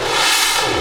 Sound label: reverse; Techno Trance Essentials; FX & ATMO